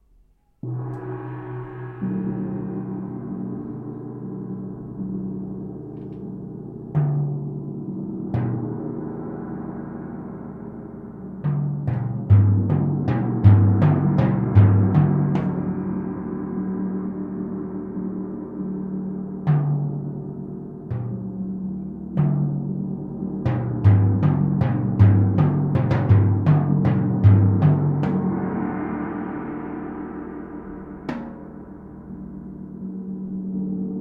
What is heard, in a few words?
tam tom